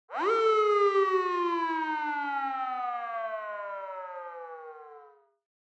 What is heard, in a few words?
Police Car Siren Close